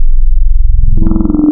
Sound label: harm, wavetables, Classic Synths